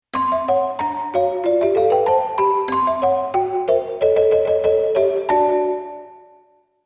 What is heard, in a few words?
Celesta; xp-80; roland; roland demo cd-3